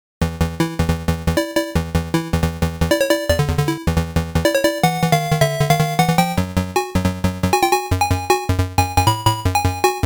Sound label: Beat Back (Stage 2 BGM)